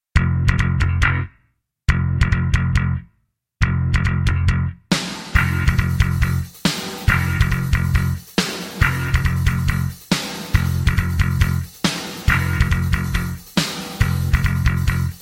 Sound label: F To G No Backing Vocals, backing tracks